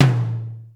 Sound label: ROCK T; BEAT2000